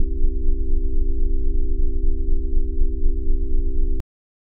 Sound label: c major chord bass click harmonic imperfection wheel imperfection, hammond